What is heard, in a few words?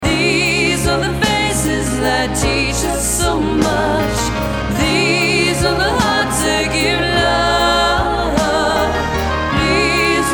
VOCAL